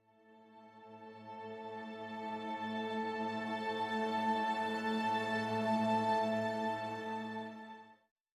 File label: music interactive; World of Tanks